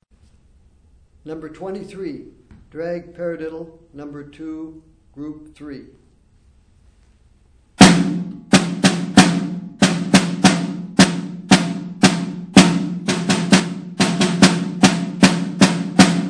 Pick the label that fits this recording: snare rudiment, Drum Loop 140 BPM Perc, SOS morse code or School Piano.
snare rudiment